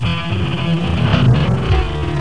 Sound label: BUZZ; cdromtoday9